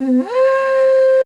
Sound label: FLUTE